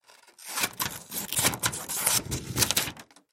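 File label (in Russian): резка бумаги ножницами. асмр вибрации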